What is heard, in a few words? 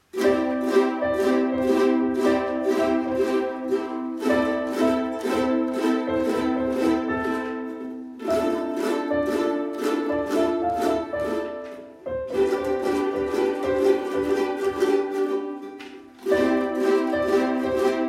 Ukulele